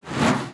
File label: bet Line Smoke